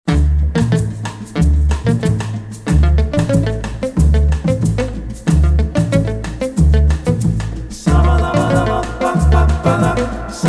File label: Bossa Cubana